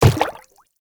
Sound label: Inf Quadruped Medium Walk Water, Infested, Footsteps; Warframe